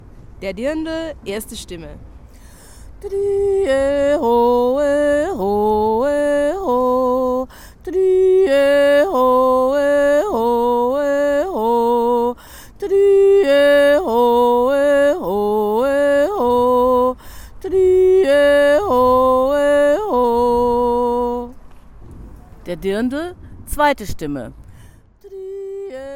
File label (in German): Jodler